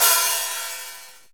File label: HH HH, HH Real